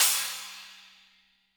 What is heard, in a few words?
HiHat & Crash, Roland TD-20; Crashes; HiHats